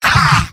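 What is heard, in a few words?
laugh short, male; demoman; tf2; garrysmod; mvm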